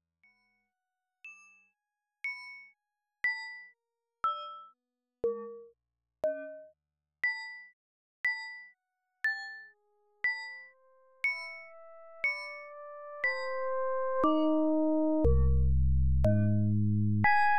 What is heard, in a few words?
Web Traffic Sonification (Slow)